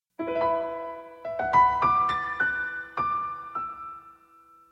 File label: tone short